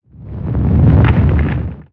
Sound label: Spinjitsu Earth One shot